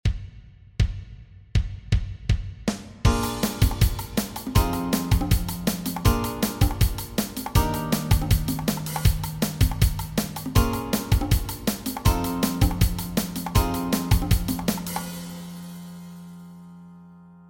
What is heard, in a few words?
Backing Track, walking bass